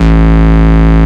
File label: Bass Root A